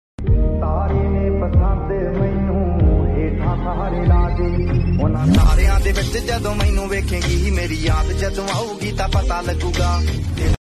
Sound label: laughing; ashneer grover and aman gupta; audio meme